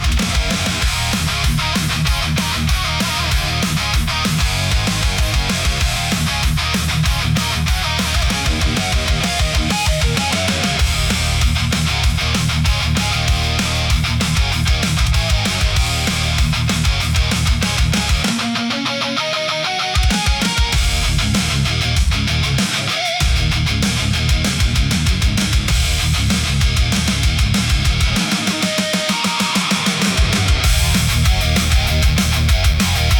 hard rock for powerful

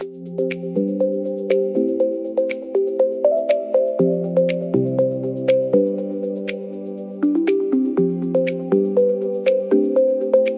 ukulele tropical for bright and light

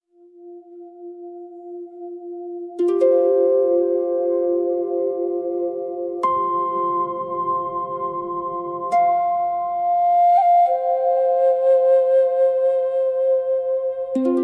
quiet japanese ambient for spiritual; unity and beautiful scenery

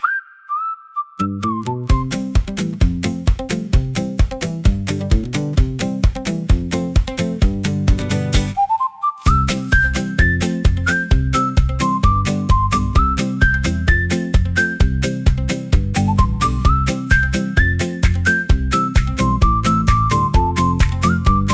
whistles, for cheerful active; morning

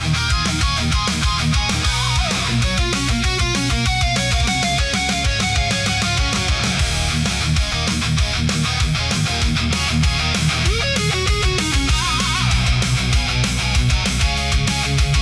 hard rock for powerful

